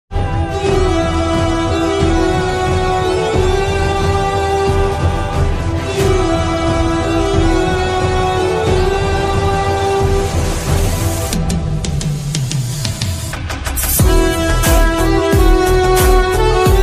Entry Ringtone BGM REAL SOUND EPIC; devara

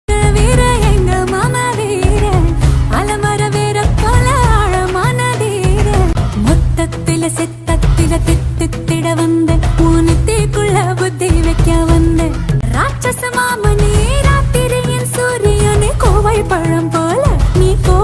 song ringtone tamil bgm; ponniyin selvan; karthi

